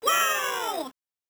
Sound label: purchasing new zone